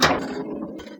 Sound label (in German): Kugelabschuss